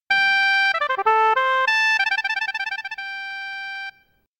chester flute; Guardian's Crusade

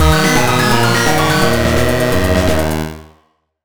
Level Incomplete; Crystal Mines; nds gamerip

